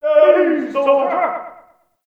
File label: Ambient Campsite Yells; Warframe; Tau